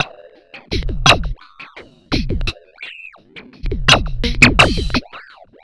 Drums Strange

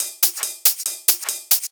Loops 140 BPM Hihat Percussion Loops

